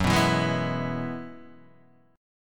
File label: E Major F sharp; strummed